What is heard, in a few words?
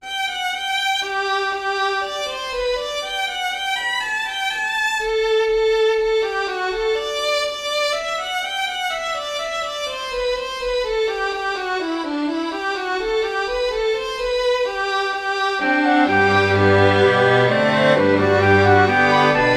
College Hornpipe